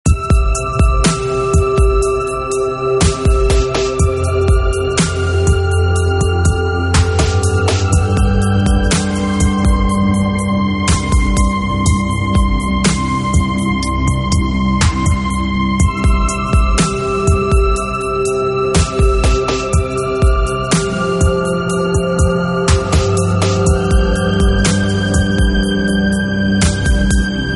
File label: FREEWHEELING drums